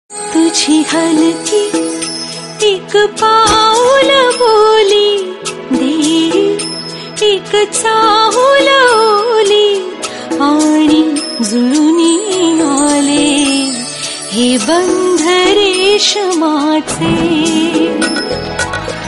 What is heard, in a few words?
marathi sad tune